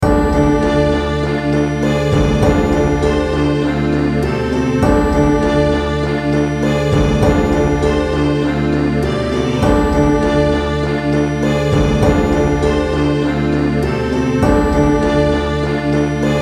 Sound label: dungeon